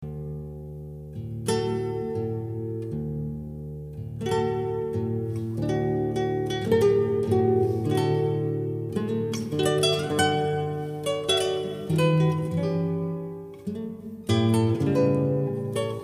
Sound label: tango